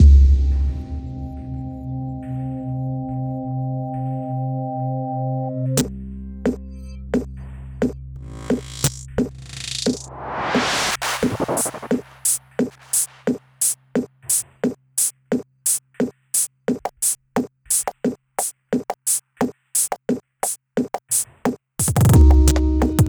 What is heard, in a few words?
Mysterious Drum n Bass